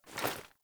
Foley Action Default Melee Swipe, Default Clothing